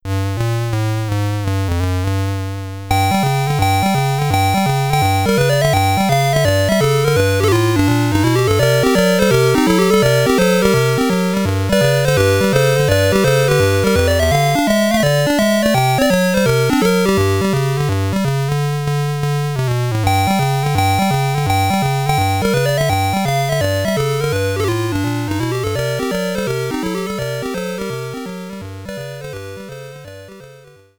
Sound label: High Score SID Stereo, commodore 64; Roland's Ratrace